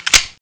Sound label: deagle mag in, weapons; svencoop